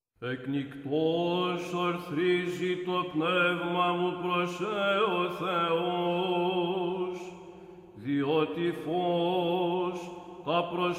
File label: Verse and alleluia, Greek Byzantine Choir; Holy Week Hymns